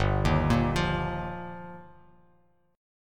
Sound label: G Minor 7th Sharp 5th, Bass Standard; Gm7#5; arpeggiated; JGuitar; 3,6,8,10